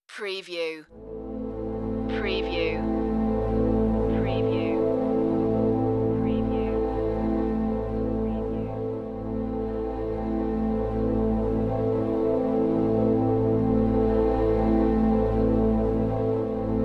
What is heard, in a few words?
a tense moment strings only